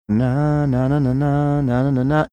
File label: singing portion